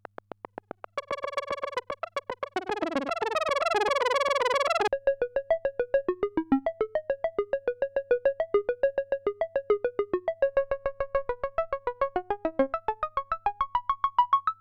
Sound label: MC202 Lead Synth